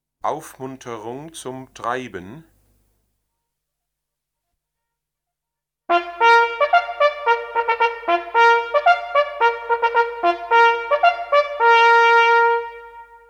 Aufmunterung Zum Treiben, jachthoorn

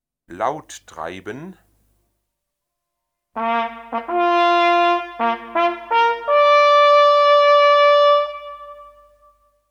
Laut Treiben, jachthoorn

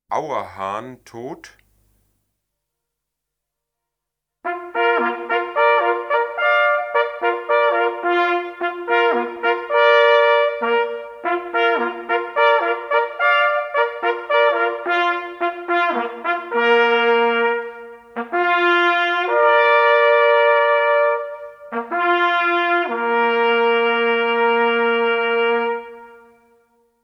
Auerhahn Tot

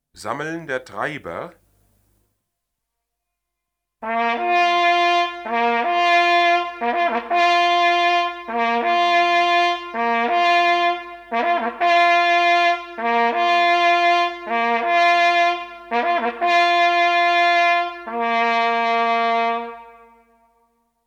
Sammeln Der Treiber

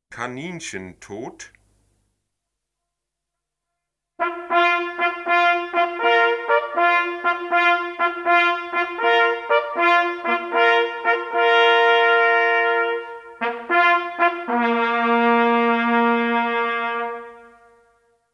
Kaninchen Tot, jachthoorn

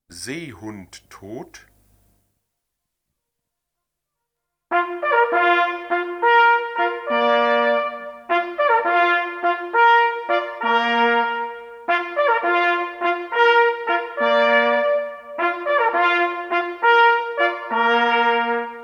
Seehund Tot

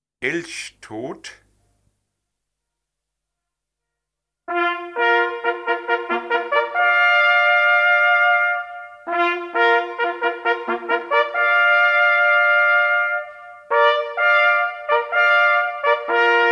Elch Tot, jachthoorn

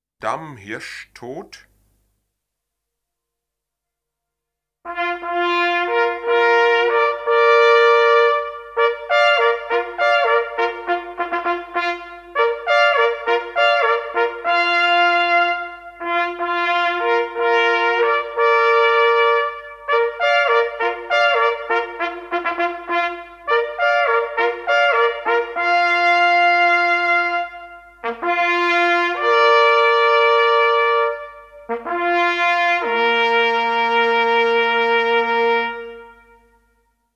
Damhirsch Tot

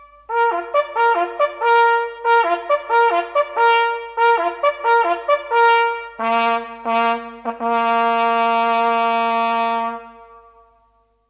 Anblasen Zum Treiben, jachthoorn

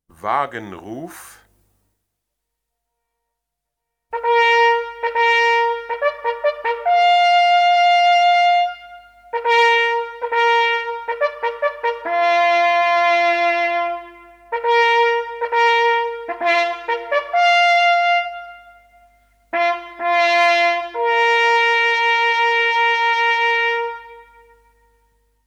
Wagenruf, jachthoorn

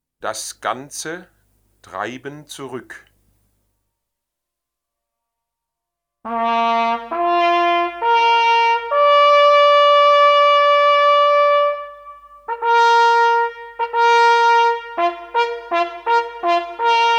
Treiben Zurück, jachthoornblazers

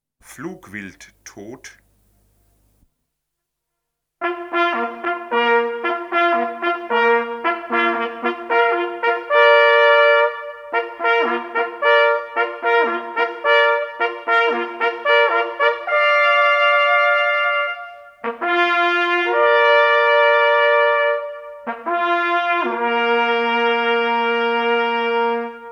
Flugwild Tot, jachthoorn

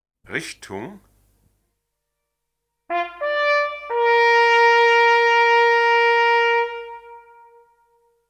Richtung, jachthoorn

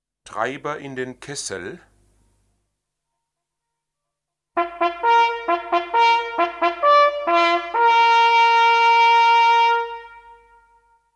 Treiber In Den Kessel

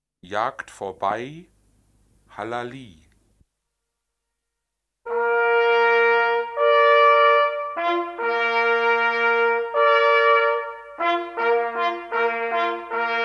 Jagd Vorbei, jachthoorn

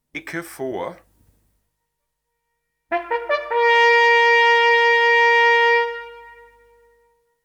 Ecke Vor, jachthoorn

